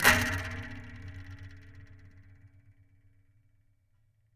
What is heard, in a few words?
Scrape